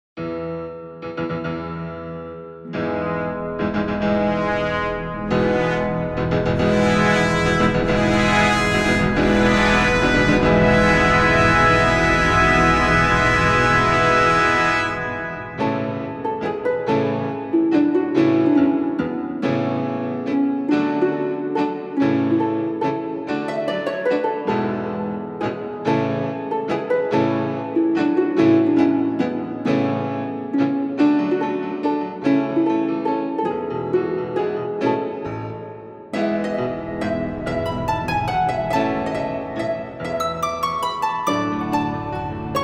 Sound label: Mazurka